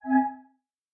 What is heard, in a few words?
Error